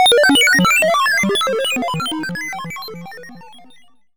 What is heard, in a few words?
sci fi; Masterbits - Switch FX